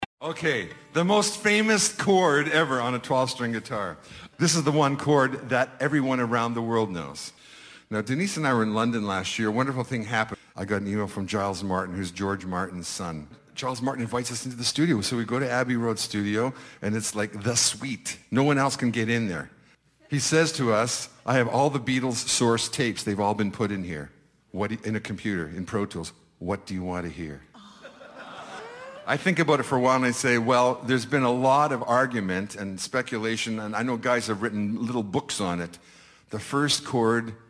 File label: chord